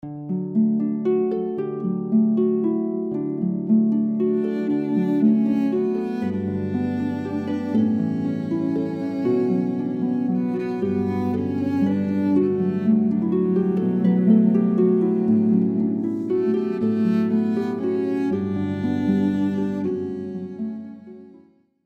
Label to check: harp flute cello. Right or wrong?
Right